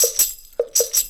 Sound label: Roots Reggae; Sounds Good - Roots Reggae